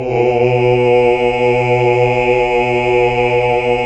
Basses; Sound & Vision - Gigapack II CD 1 (Roland); HAL Greg. Basses

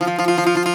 Greek Bazouki, Plucked Strings

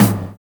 LOW TOM; Univers Sons - Kitch 70s

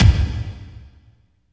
KICK, Tekno Industrial; East West

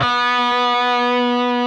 PRS C, Guitars, LEAD

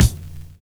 NOISY TRE; Univers Sons

